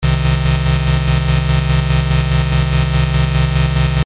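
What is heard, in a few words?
Tremolo, the tremolo effect, on the other hand, uses an LFO to modulate the volume of the signal, creating a rhythmic pulsing or shaking effect.; This volume modulation is key to achieving the characteristic tremolo sound.; low frequency oscillator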